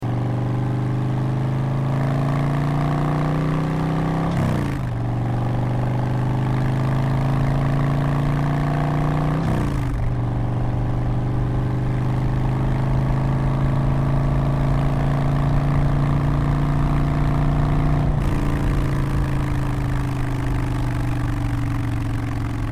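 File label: Softail STD w Big Radius 2 2, motorcycle exhaust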